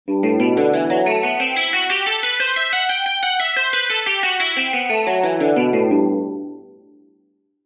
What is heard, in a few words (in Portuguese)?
Exercícios Guitarra 120 bpm